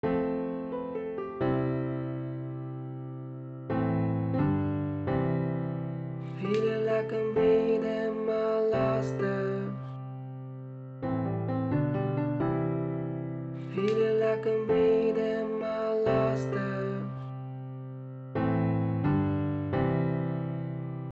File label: piano